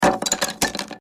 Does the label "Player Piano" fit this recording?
No